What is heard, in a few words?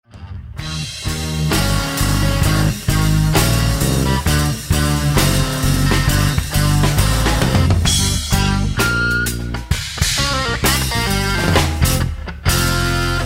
Heavy Blues